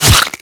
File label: Bullet Flesh; Warframe